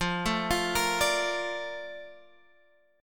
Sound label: Bb Major 7th, F